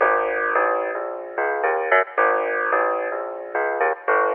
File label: piano eighties, Loops; Phonky